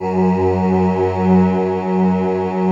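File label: slow orchestra pad, Slow Pad 5th; Optical Media International; Sonic Images Library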